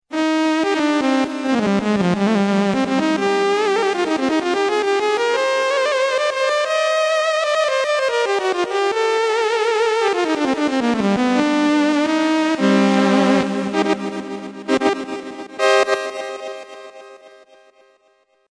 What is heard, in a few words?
moog